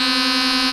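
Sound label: Rave Synth, Dance Mega Jungle Rave; Best Service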